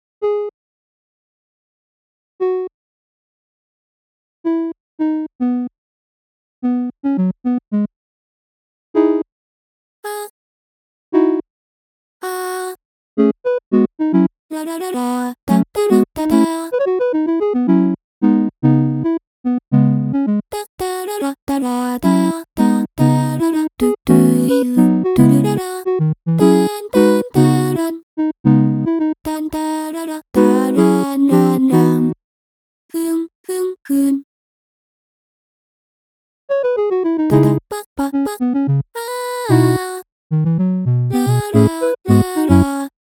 one synth one voice; weeklybeats-2020

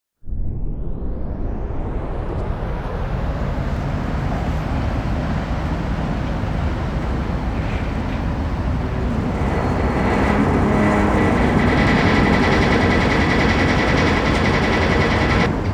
fieldrecording bridge crackles; big ass; weeklybeats-2020

